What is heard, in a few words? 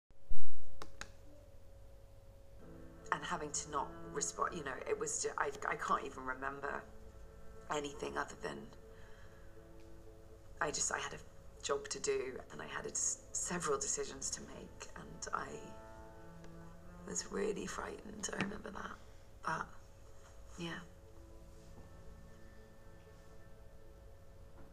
TV; BBc2